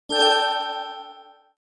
flare, notifications; HTC One-X

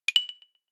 Sticks and stones, notifications; Google Pixel 6

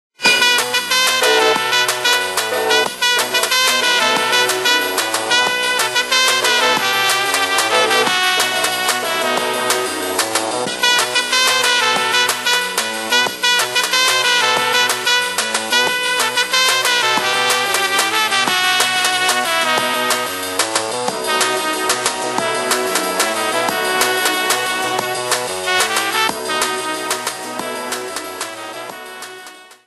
Ring Easy Roller; HTC Touch Diamond

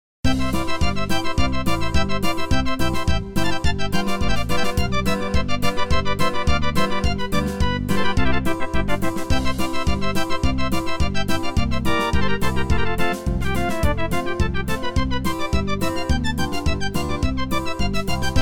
Fast step; ZTE; F116

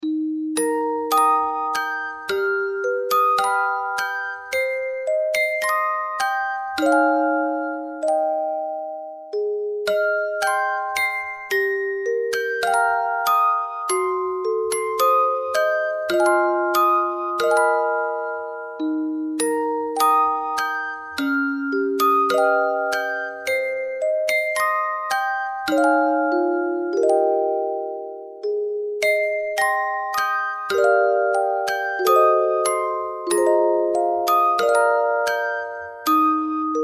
Orgel, phone; LG; Hanabi

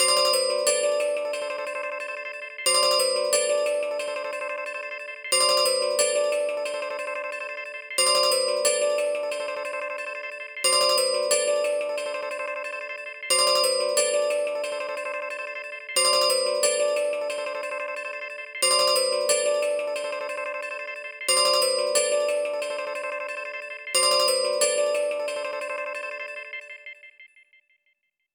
Red, ringtones

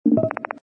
beaming fail; Samsung SCH-i539

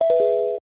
call disconnect

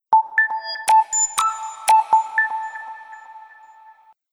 Ticktock tone; Samsung SCH-M710 T Omnia II

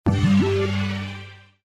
Shut down; LG; U960

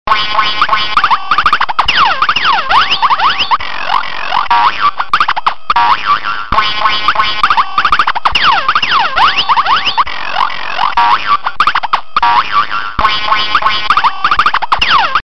Robot Failure; Retro Ringtones

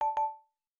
Low Battery Calm; Samsung; Galaxy A20

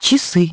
menu; Hyundai H-MP718

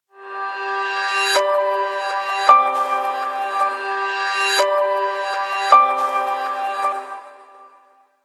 Dramatic Piano, ringtones; Motorola MOTOLUXE-XT615

